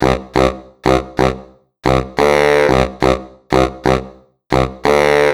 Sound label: Baritone, лёгкая музыка; Сэмпл духового инструмента (Brass); Сэмплы духовых инструментов (Легкая музыка)